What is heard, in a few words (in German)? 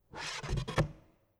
Stuhl abstapeln, Chair; Bell Chair